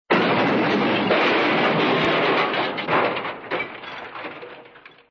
am thanh cua tham hoa

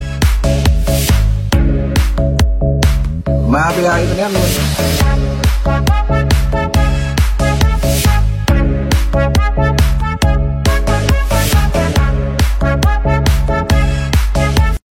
Remix Nhac Chuong

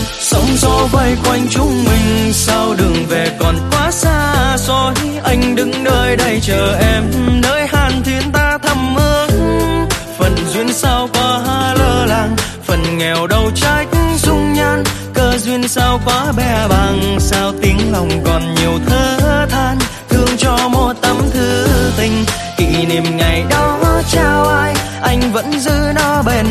Tieng To Long

